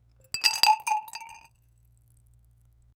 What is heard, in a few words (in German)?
magisches Trankmischen, potion